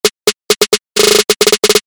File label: SNARE ROLL EDM 132BPM